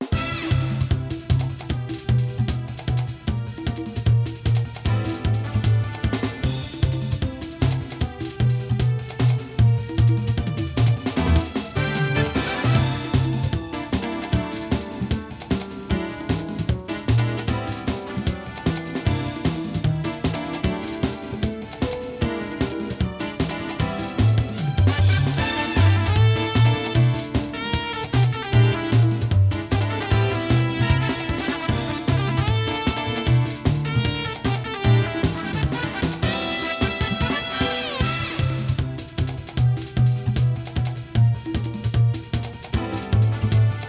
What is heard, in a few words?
Continue; Asuka 120% Final Burning Fest; PlayStation